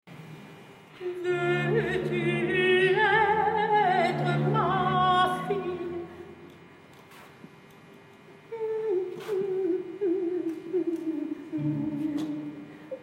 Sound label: Lullaby